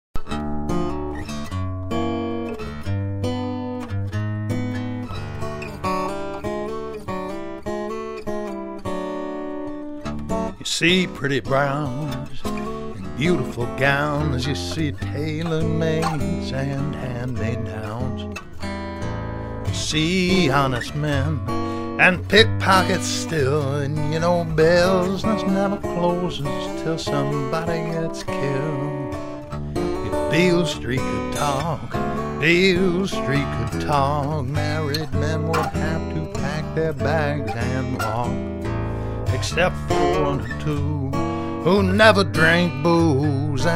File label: RCA BA-71B 1U, Neumann U87 on vocal; pre-amps